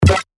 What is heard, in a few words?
E, percussion; Pac; revealed percussion vol 3